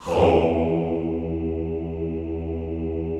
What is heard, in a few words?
"houuu" E1, classical choir; Best Service; Extended Classical Choir